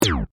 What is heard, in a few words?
Laser Gun Fire, Shot; Science, Fiction; Laser Sound Effects